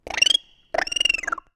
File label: Baby Frong Croak